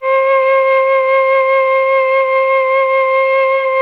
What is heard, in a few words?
Melfluc5, MelloFlute; Oldies